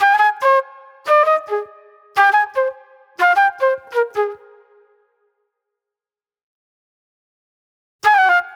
Raw Funk Flute

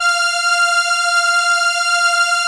ARP F4, STRENS; Sonic Reality; Vintage Timetraveler

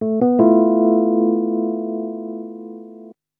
D&B Keys Riff; neuro

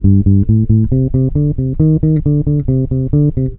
PUNK G, Bass Grooves; USB Soundscan vol.30; AKAI

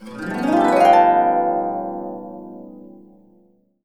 A, Harps; USB Soundscan vol.62; AKAI

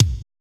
kill kick, R+B kits; Drums In Your FACE!

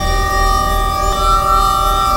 FX, Futurist Drum & Bass; Sonic Foundry (Sony Creative Software)

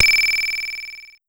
Pollard Syndrum

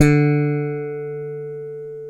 E Bass Slap

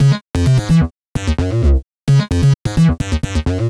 NRG Lead, trance

